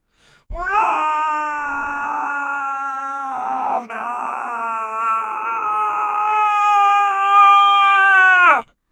male dwarf battle cry; humanoid

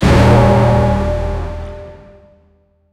sharp orchestral hit as t; accent

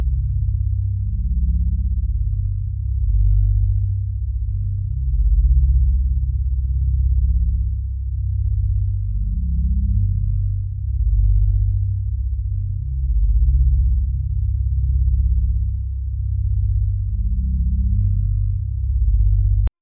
slow soft bass pulse loop

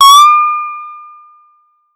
steal alert

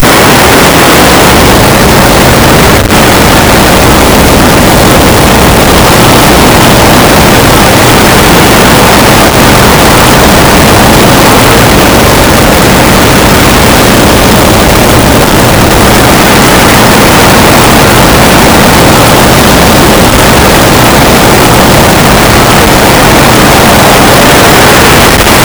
peaceful soothing sounds, cruise ship

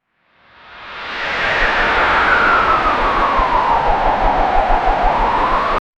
continue the intense fpv; streak

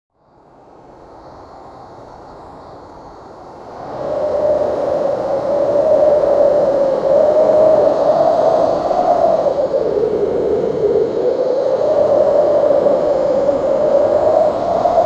start from silence, gale

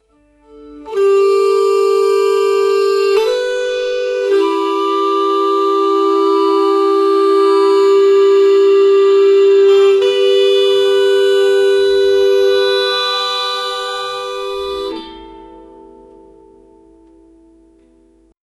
blues guitar strumming

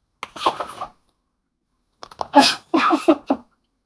circus

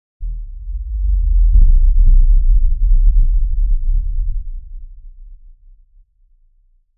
bass drop effect for dark

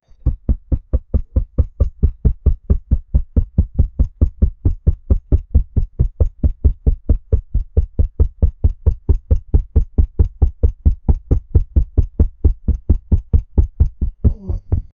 bison heartbeat and calm

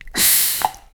beverage

